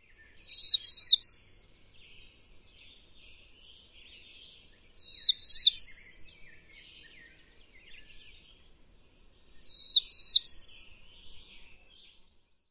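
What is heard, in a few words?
songs, Black-throated Blue Warbler; NY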